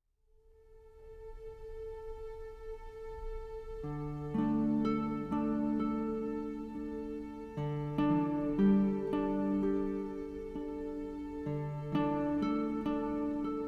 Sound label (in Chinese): 广告片音乐